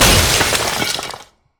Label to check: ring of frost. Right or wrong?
Right